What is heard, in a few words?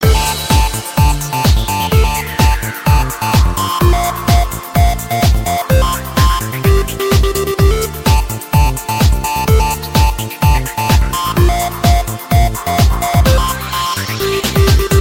Dance Beat Tone